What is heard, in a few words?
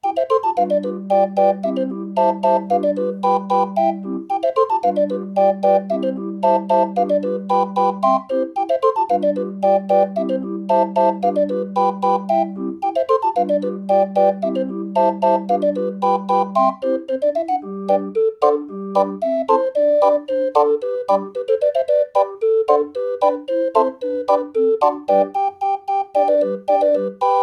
Polka, drehorgel; Champagne Polka